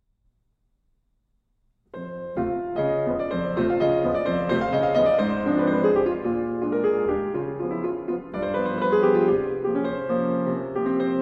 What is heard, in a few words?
Allegro Grazioso, Poco Rubato